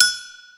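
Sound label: Agogo, Yamaha SY22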